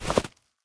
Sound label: snow, step; Minecraft; Votive World